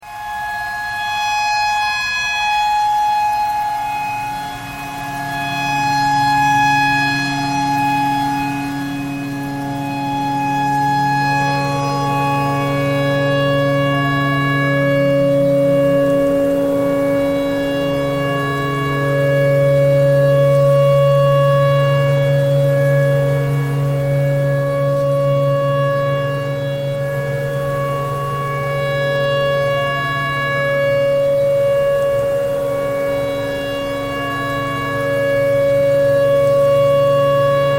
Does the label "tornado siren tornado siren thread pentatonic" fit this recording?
Yes